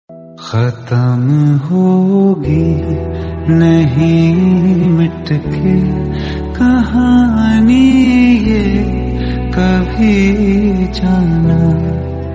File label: sad version ringtone